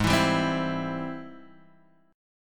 G sharp Augmented Major 7th G sharp, down, Guitar, Standard; strummed; JGuitar